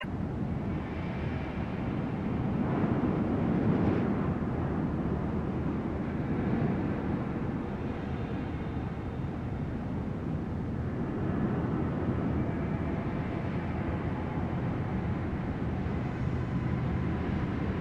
Music BGM Inside Enviroment; Wenjia

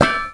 Metal footstep; starbites

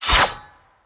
Impaler Earth Ready

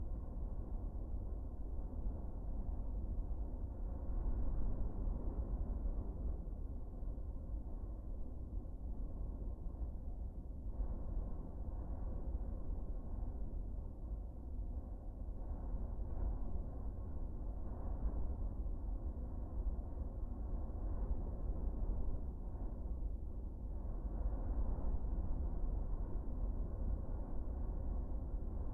Ambience Desert Base; Starbites; PS5, Steam, Switch, Switch 2, Windows, Xbox Series X/S

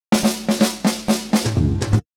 Roll 4 beats

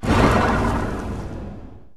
Flamebearer Fire Spiral Cast

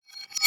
Physics-Object Wrench Impact Rev

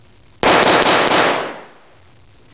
SHOOT; Gothos

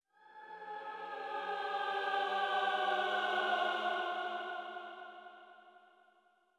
Choir

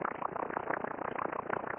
Purple Teleport Static On

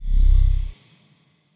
Totem Awake; Soul

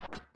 Climb Metal

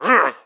Shield Attack

